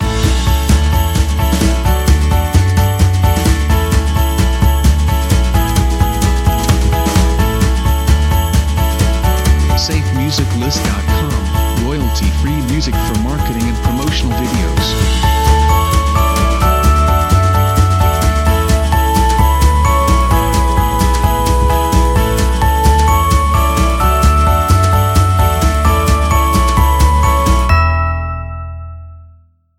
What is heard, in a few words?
Christmas Hurry; SafeMusicList